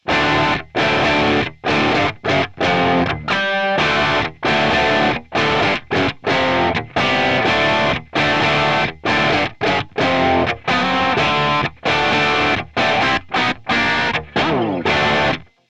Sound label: plexi